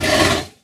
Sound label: snort